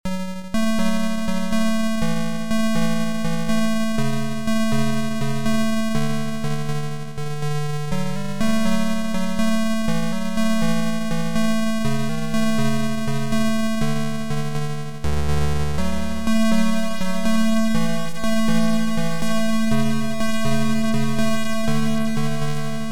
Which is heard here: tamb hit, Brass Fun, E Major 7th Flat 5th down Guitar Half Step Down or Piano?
Piano